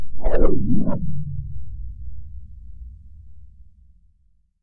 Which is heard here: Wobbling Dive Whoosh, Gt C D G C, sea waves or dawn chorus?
Wobbling Dive Whoosh